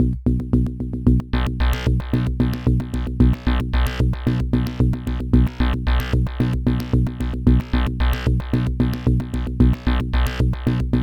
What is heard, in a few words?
Beat Ambience; Streets of Rage 3